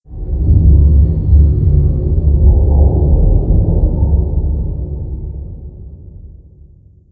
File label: mall random, ambient